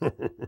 laugh evil; Pyro

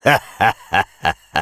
laugh evil

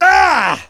pain severe, ru; Demoman

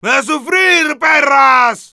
yell; Heavy

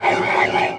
throwable growl

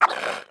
throwable attack

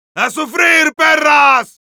yell

